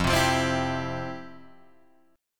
F Major 7th Suspended 2nd, Guitar, Drop D

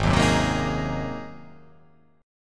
Ab 9th Ab down; Ab9 strummed

